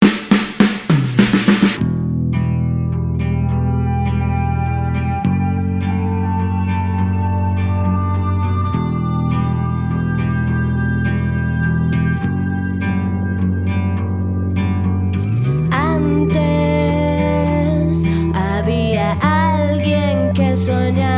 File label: okarina; sol